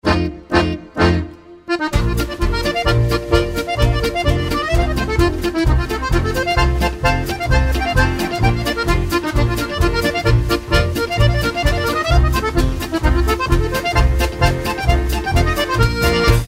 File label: Clarinet polka